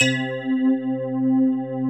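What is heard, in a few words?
PERCUSSIVE ELECTRIC PIANO C 3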